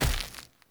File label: Walk Default, Footsteps; Avakot; Friendly